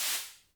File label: hatch; sundance